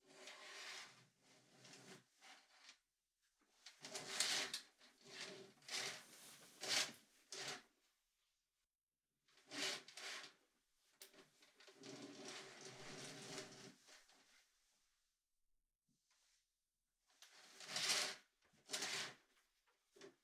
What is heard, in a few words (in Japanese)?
カーテン開閉; 3DIO; 色々な動作